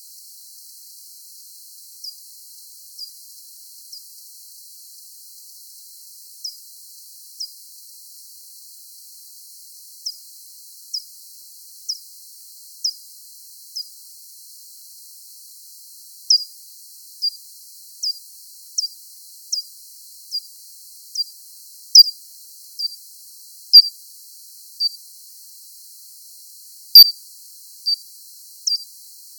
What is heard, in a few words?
PIPPIP